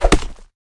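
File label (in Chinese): chop, 挥动斧头砍树音效; 格雷格; 绿野世界; 卡通农场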